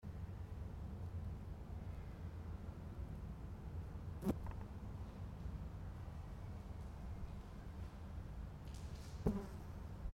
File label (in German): Fliege, Fly; Proximity; Holthausen/Düsseldorf; Christoph Collenberg; Chris Erkal